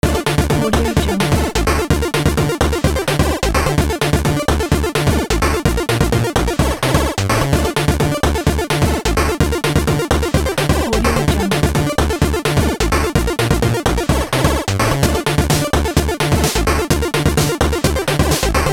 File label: game 8bit loop